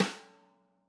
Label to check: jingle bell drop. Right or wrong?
Wrong